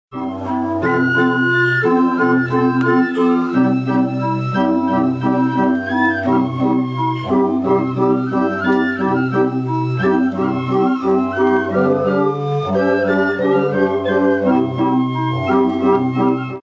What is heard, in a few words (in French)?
bambous, indonesie